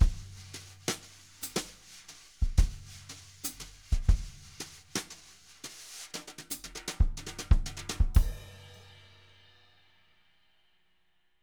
jazz brush loops

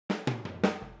Boogaloo - Hi-Hat, 82 BPM; Drums; Intro; Sonic Foundry; Drums from the Big Room The Mixes

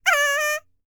C ASIA, World Voices

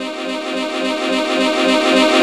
Dubby Reverse Swell, Effected Sax; Jazz; Sonic Foundry; Jazz Solos and Sections

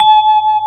Vibra F 3, Jazz; WORS STATION

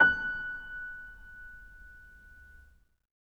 Acoustic Piano

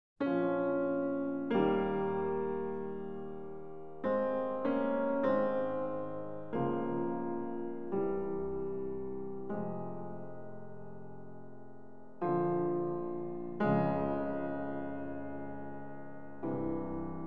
Hymn; Sacred Hymns

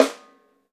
RAW SD, Drums Acoustic; USB Soundscan vol.10; AKAI; 90s sample CD; ALL SD

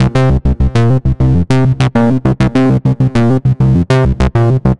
Synth Bass

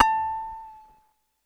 ASIA KOTO A4, Yamaha VP-1

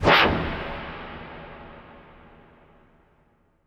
Wipe, Cinematic Impact; M-Audio; Pro Sessions VOL-25; Liquid Cinema

